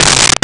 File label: Floor Tom; Nintendo; July 2020 Gigaleak